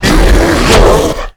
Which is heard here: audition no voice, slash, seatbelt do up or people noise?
slash